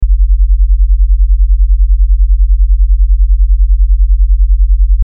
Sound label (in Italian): Battimenti 40 50